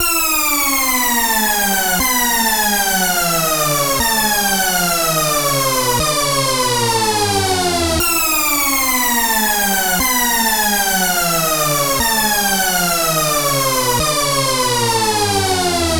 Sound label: SFX, Trance NRG